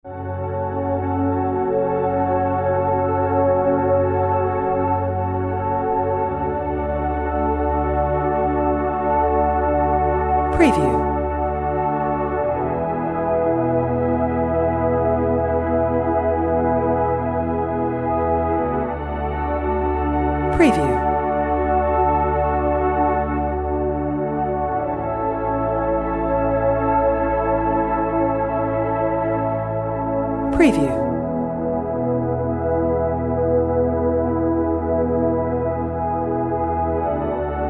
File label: Dramatic Ambient Background Music